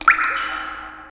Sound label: DRIP; Thief